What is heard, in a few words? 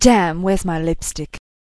death